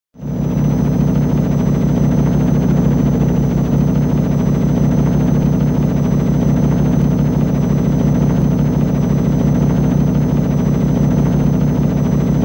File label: Internal Hum; Airwolf